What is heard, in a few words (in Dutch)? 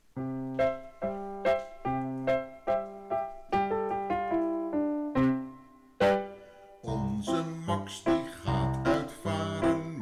lied met zang; Bieb Almere